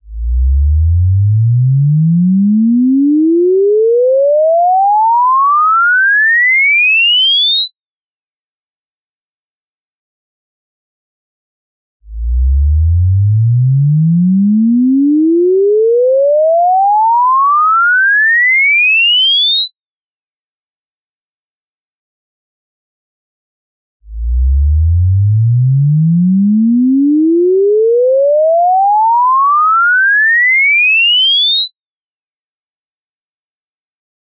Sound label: Sweep